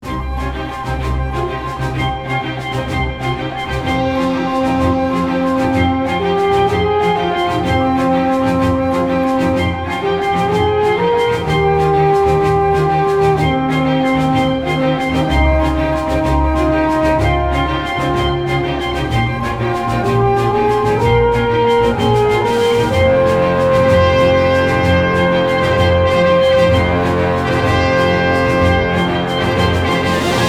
Babel Tower Waiting Intro

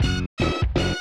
VS Jingle; ClayFighter 2

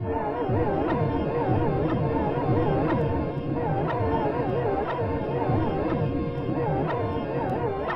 Pit and Temple B - Ambient

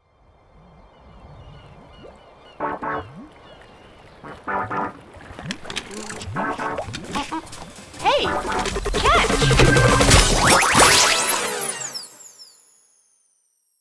Cinematic Intro cutscene; Crayola Scoot

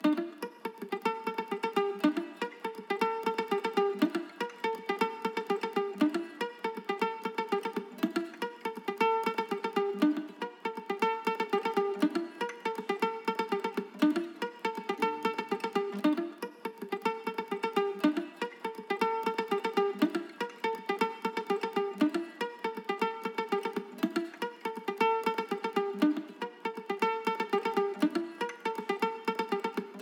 Guitar; raji an ancient epic

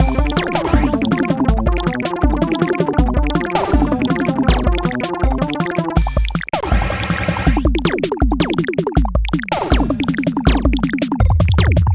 Electro

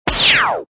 Laser Blast

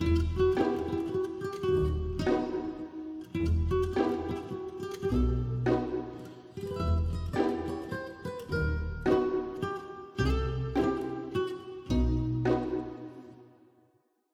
Guitar Melody

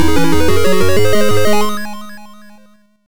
Level Complete; Turrican 2; Atari ST

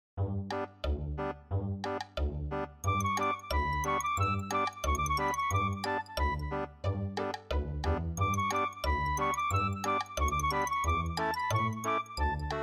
MIDI Town; Star Merc

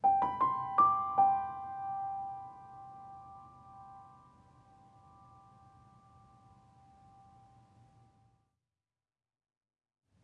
Music Level Argument Stinger; Florence

